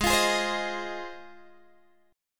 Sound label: Ab 6th Ab, Guitar, Open G